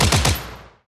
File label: heroes broden bullet rain loop end